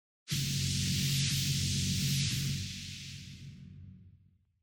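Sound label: Compressor air out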